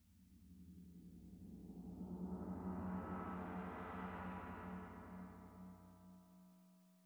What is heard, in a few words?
Tam-Tam; Tam-Tam Rear